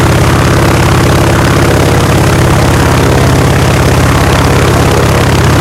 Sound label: wildcat rear, engines; War Thunder